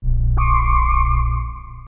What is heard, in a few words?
alien